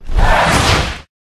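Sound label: Dragon Skeleton attack mid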